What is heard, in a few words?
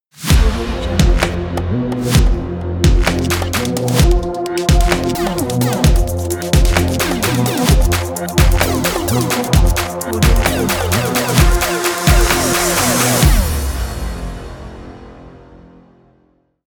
modern stylish ident